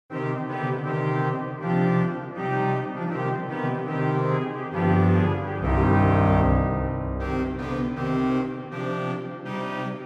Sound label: Vivace Leggiero, OrganMusic; Boely; Souvigny